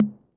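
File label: 606 high tom; Crypton - DrumBase5000; TR-606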